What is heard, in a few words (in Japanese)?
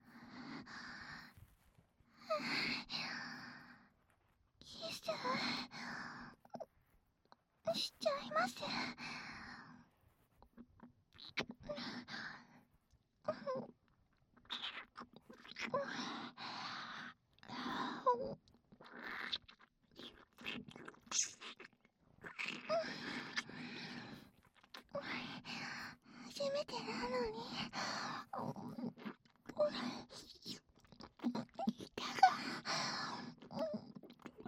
奥までディープキス